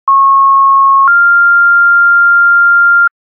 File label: dubois all call, tones